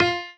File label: piano melo